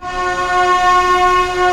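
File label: STR C2 PP, strings; Sound On Sound - Peter Siedleczek's Orchestra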